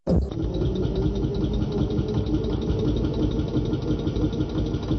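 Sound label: compressor start, Train; OpenBVE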